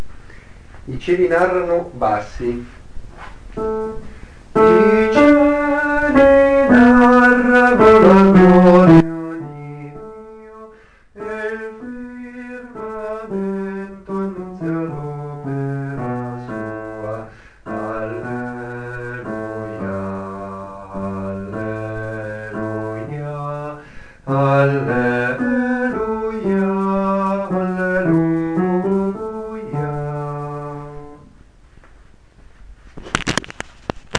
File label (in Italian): bassi; I cieli narrano